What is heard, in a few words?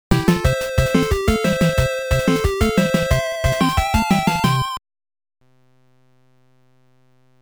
Kick-Off; nes; tecmo bowl